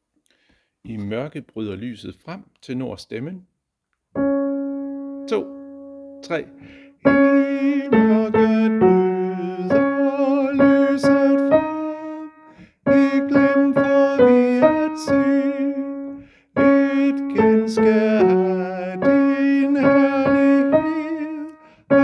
tenor; harlev koret

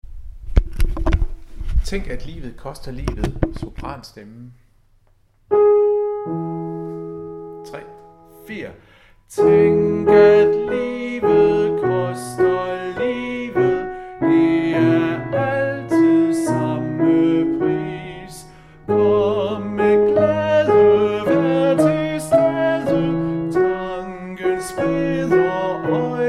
sopran; Tænk at livet koster livet

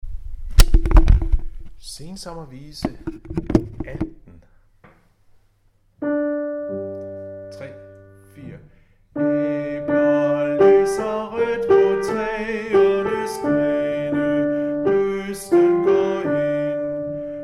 alt, harlev koret

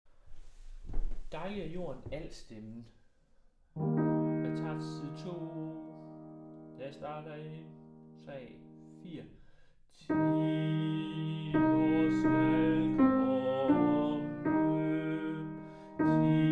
Dejlig er j. alt; harlev koret

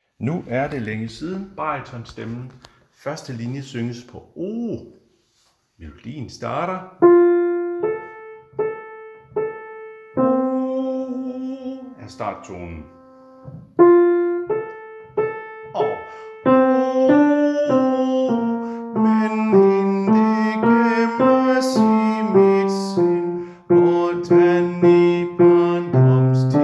Baryton, koret

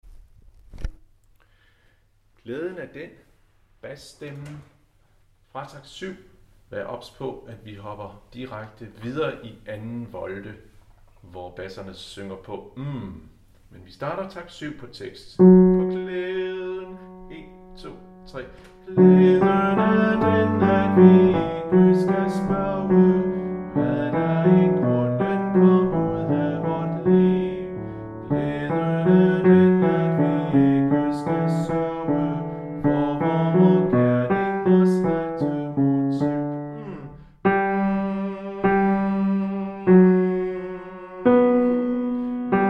bas; Glæden er den; harlev koret

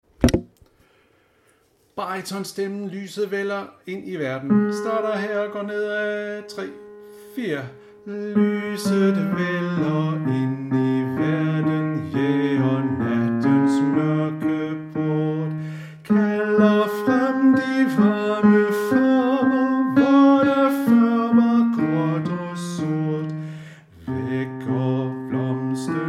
Baryton; Lyset vælder ind i verden

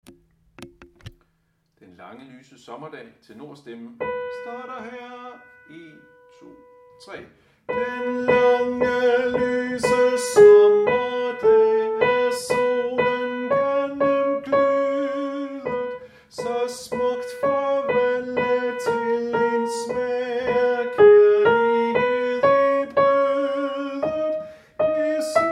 tenor; harlev koret

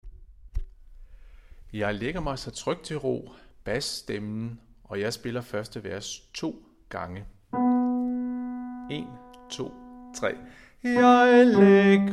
bas; Jeg lægger mig så trygt til ro

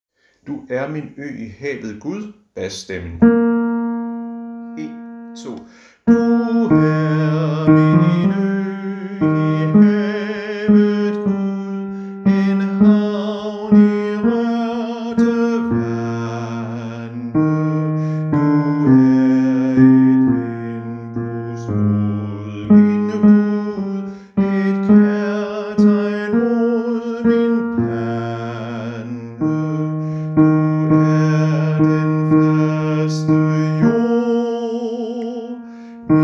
Gud bas; harlev koret

